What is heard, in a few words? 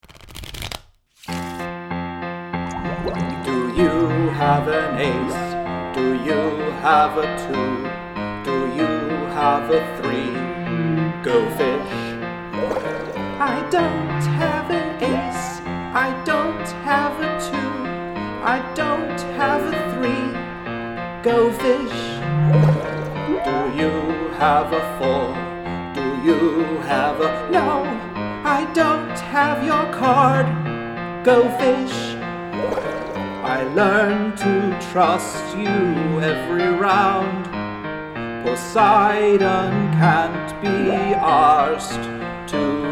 Piano